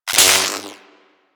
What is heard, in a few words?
Lightning Rifle Spark A; Crp Chain Lightning Rifle